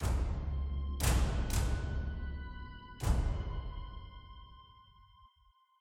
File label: level Up